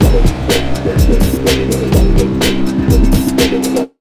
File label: BEA, Big Beat Drumloops; USB Soundscan vol.25; AKAI; NYC 125